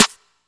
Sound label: Snare, drums one shots